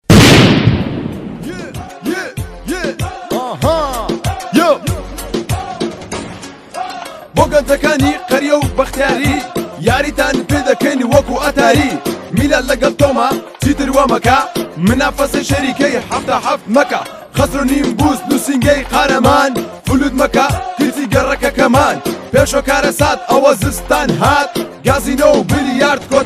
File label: rap